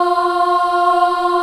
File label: F3 child ah, choir; USB Soundscan vol.28; Acoustic & Synth; AKAI; CHILD AHF